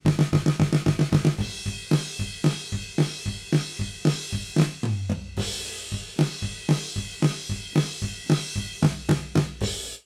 drum dual mono 20ms, Dual Mono with right delayed 20 ms